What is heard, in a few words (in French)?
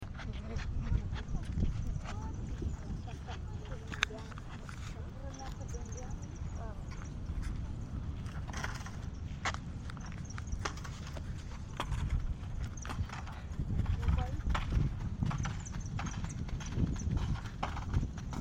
lessive embouchure